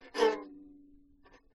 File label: violin staccato condenser; aston origin